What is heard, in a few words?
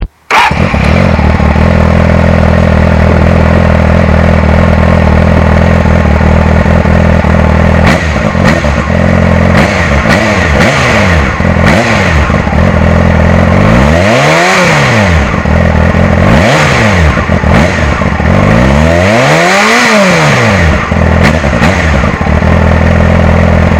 CB600 Hornet